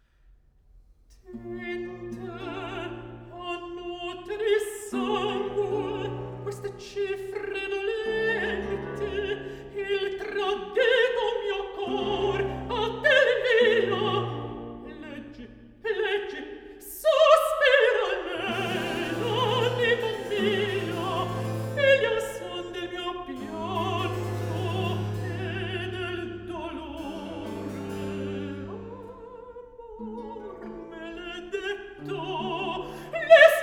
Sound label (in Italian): Recitativo; CANTATE D'AMORE; Tinte A Note Di Sangue